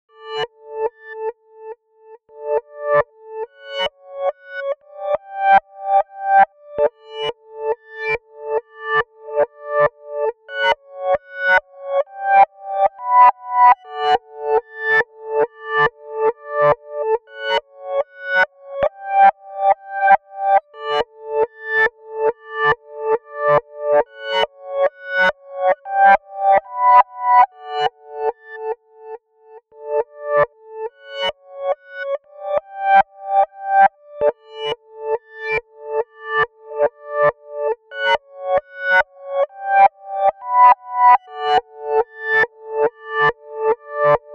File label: Backnoise